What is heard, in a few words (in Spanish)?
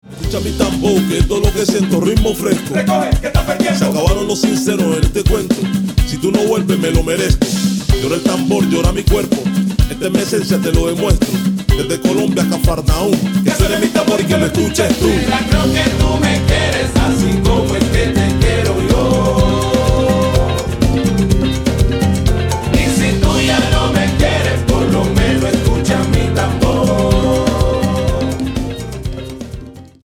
Tambó